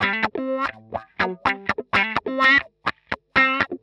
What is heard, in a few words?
125 wah A, wahwah; PowerFX - Nu Jazz House